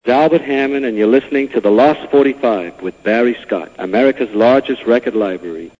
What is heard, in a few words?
Hammond